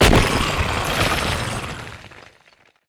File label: Totem Death; Needlerock